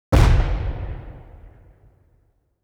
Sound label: Explosion Short Medium Size